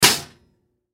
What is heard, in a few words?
Teapot Set Down, Teapot Set Down Stove Hard Tea; Tea Kettle; Dishware; Household; Blastwave